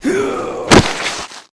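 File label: Elf Archer Die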